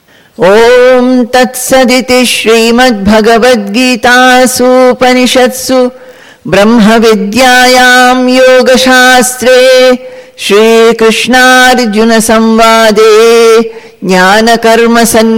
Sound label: Ending Chanting; chapter 4 jnana karma sannyasa yoga; bhagavad gita; conclusion